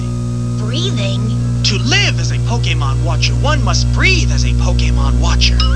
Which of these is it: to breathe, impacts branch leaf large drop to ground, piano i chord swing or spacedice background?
to breathe